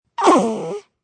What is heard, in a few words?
Baby Exhale, Newborn baby sound.; Babies (Sound Effects:Voice)